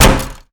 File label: wall wood; Golden Crayon Sounds